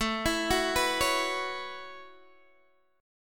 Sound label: A 6th Add 9th, Guitar, Open G; x,14,14,11,12,11